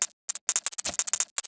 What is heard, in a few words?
special reel flash; netent